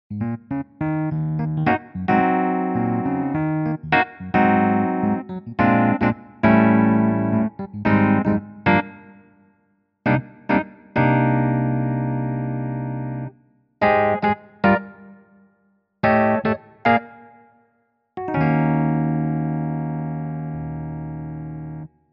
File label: Rhodes